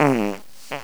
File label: fart